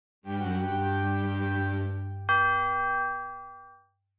Video game reward chest opening dark

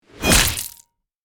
Guillotine blade drop impact hit; medieval

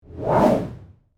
Infographic data chart zoom in

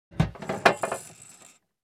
Tactical gear box open

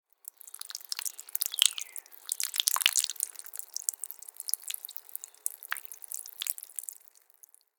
Human peeing urinating outside nature

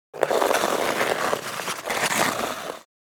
Ice hockey stick puck handling

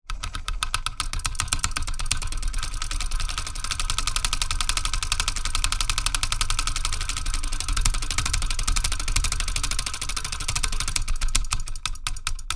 Bench grinder rattling; Industrial Machinery; gfxsounds

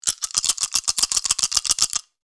Dice shaking fast board game

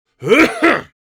Senior male severe dry cough

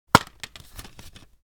Plastic CD case opening

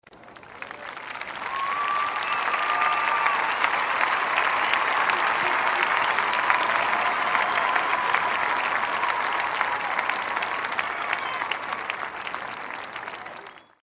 Enthusiastic applause large audience

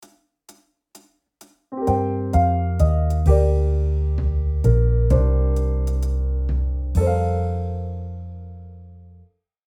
Melancholic scene sad emotional sitcom music

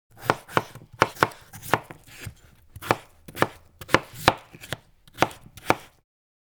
Wooden cutting board chopping vegetables

